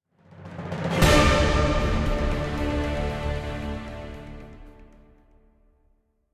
Hideout Combat Stinger; mass effect 2 gamerip 2010; Eclipse Hideout Combat Stinger

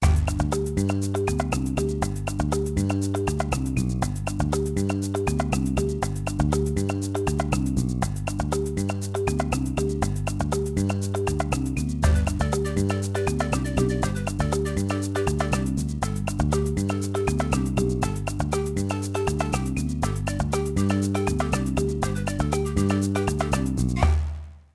Bg music; lego my style kindergarden

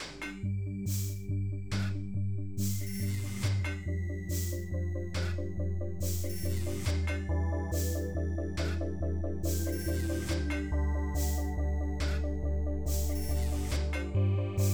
Music Gear; Dreamball

